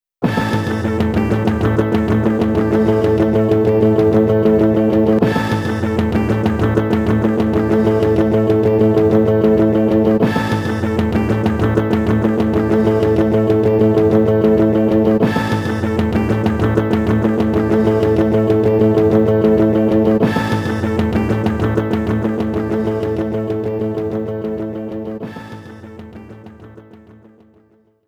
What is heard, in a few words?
Stage Clear - Ending